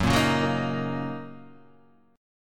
Fsharp 6th down Guitar Half Step Down

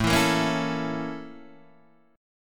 A sharp Minor Major 7th Flat 5th A

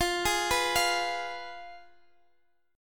G sharp Minor 6th, guitar

